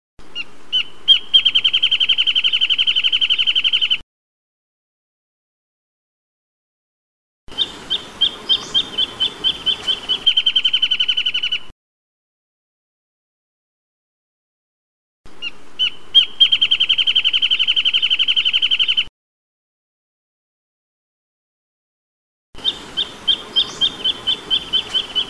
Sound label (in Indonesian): suara kicauan burung wrentit